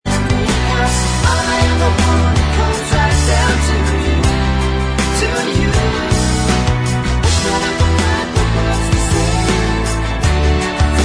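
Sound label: backing vocals, track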